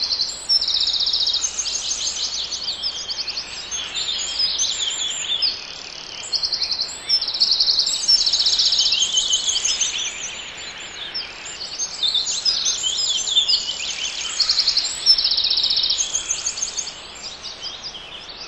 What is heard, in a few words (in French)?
Foret